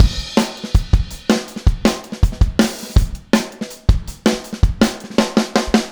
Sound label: Fill Full Mix, Drums, 162 BPM; Drums from the Big Room The Mixes; Take Your Toms Off